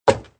wood

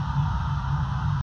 Ambient Seismic; Among Us

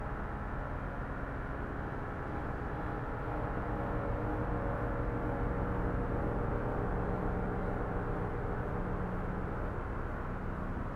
drone

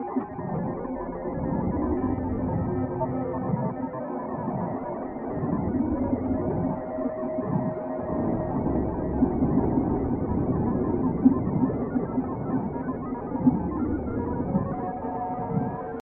Danger Tonal; Insanely Twisted Shadow Planet

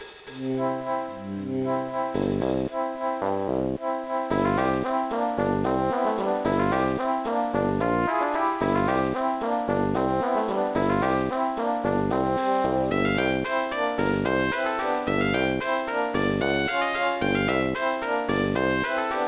BGM; Monster Rancher Advance; gba

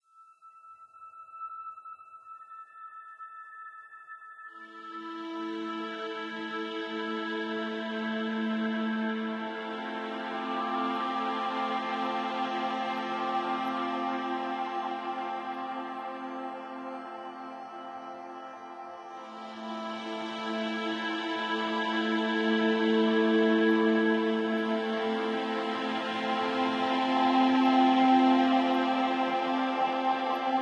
Harbour Sting; ether one redux gamerip 2015

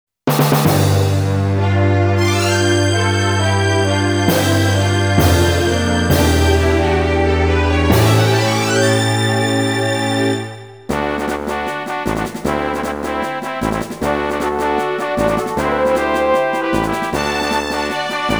Synthesizer Version; Super Famicom Magazine Volume 17

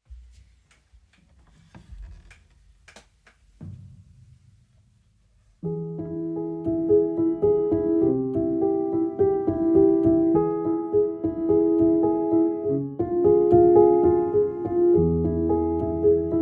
On 100-Year Old Piano; fox n forests soundtrack

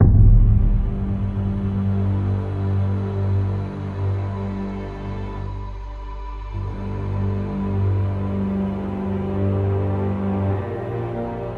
Sting Serious

